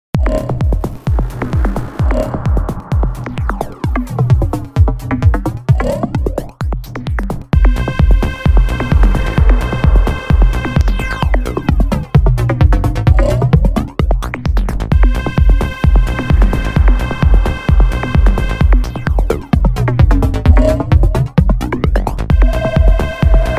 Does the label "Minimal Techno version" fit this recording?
Yes